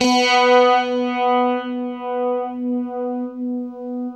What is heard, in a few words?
UNIVIB B 2, Guitar; East West; Steve Stevens Guitar Samples Collection